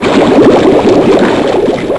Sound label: water run